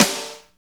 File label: SNR, Tekno Industrial; East West